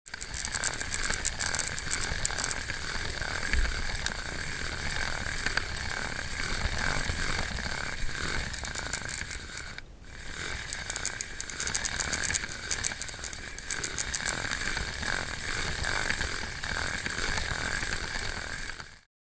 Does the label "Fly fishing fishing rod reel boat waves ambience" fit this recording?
Yes